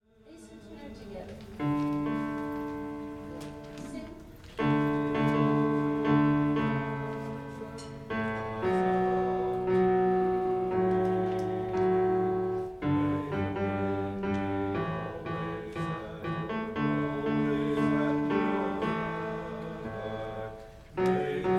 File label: tenor and bass